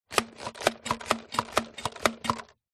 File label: leisure toy water gun pump action plastic dry fire